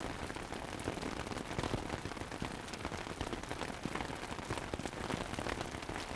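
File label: rain on tent loop; Star Wars Obi Wan; XBOX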